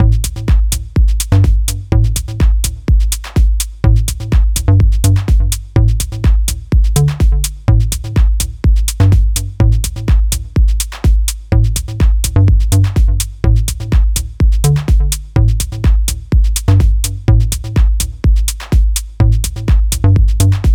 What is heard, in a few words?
Tech Bass